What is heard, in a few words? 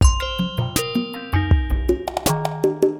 DRUMLOOP AMBNT 160; Ambient